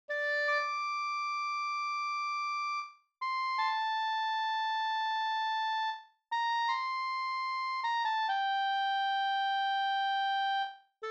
vallat

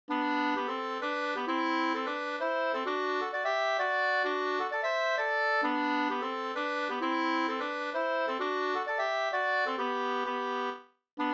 hambopolska; Gavells-Ella fr Hs NSG798 2st V2

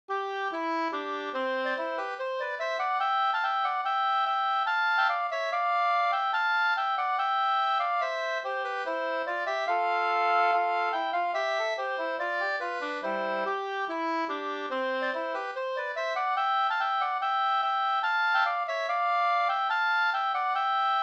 skanklat; Robert Nordstrom Lerbo

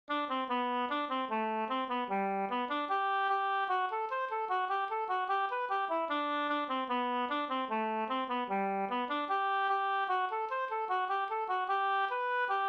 reinlender Rhenlandare, G-dur; e Per Munkberg SvL

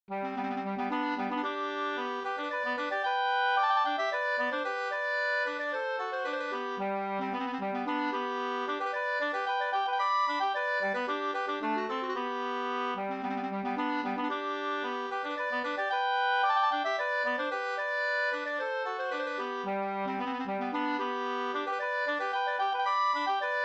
polska Bingsjopolska; Kalla karar e Pekkos Helmer 2st

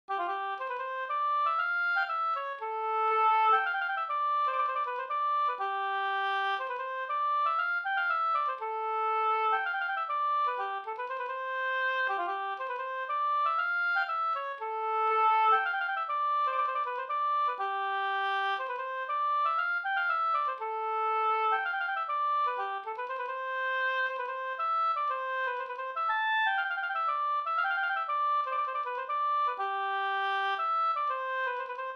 polska Bodapolska

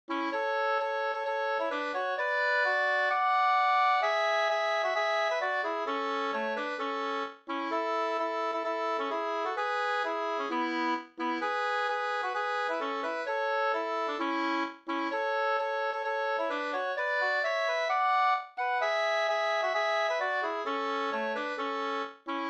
folkdans, Jagarmarsch Adur

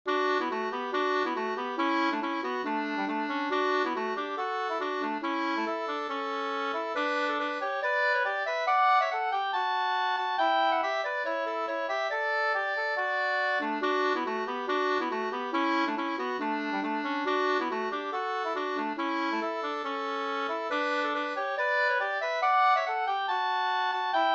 schottis; Rodon; Rickard Naslin Jm

